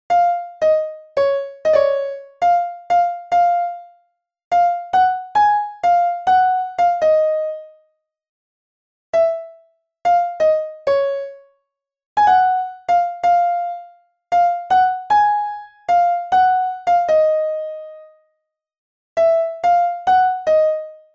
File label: Real Piano